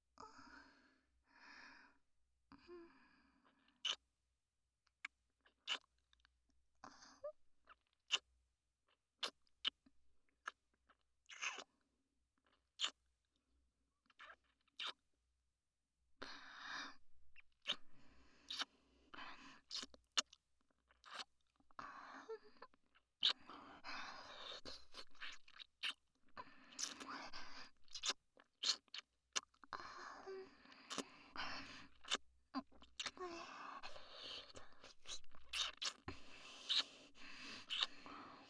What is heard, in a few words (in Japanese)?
キス; SEあり